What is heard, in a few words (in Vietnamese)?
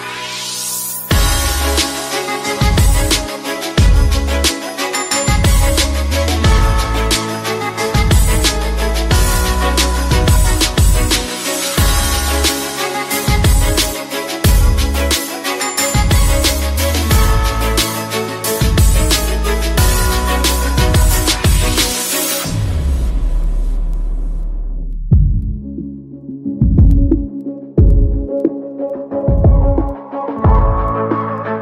nhac chuong